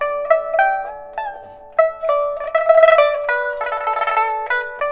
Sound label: PIPA, 90; INIS - Opium